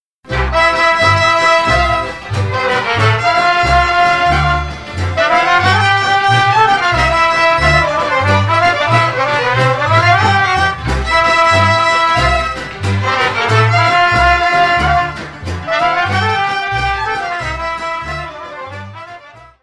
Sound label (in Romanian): Hora cu Strigaturi, Roumanie